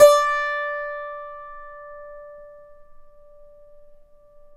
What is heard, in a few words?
D4 DULCIMER; AKAI S6000 CD-ROM; WorldSounds